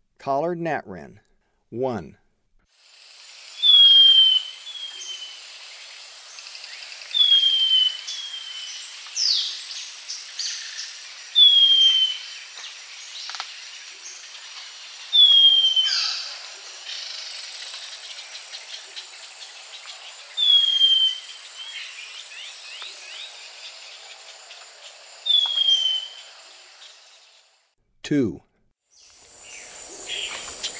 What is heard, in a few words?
Collared Gnatwren; S. America; Eastern Lowlands